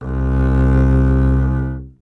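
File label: string contrabass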